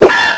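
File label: hunter attack; hunter2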